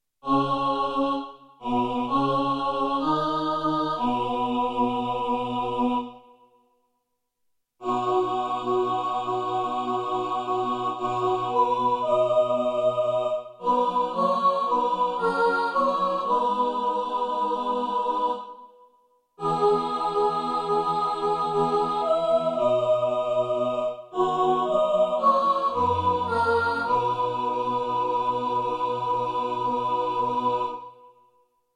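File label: dawn soprano, choir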